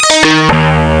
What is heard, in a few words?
Fuzz Guitar; Instruments